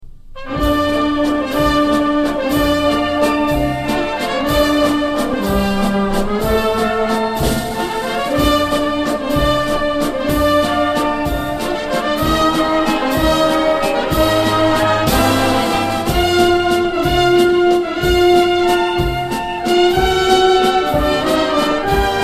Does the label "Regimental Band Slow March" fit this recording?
Yes